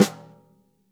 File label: snare snares on; JazzFunkKit